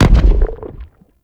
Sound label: Stomach Grenade Explosion; Warframe; Grendel